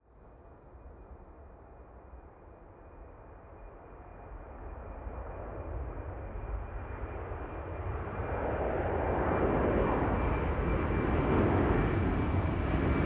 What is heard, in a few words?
plane large flyby land, Verkehrsflugzeug; flugzeuge verkehrsflugzeuge; verkehr transport